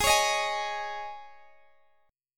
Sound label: Ab Suspended 4th Ab, Guitar Open G; Absus4; strummed; chordshape; x,x,18,18,16,18